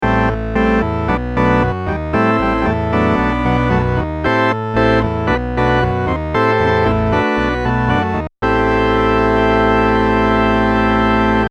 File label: Ignite My Flame, Prophet '08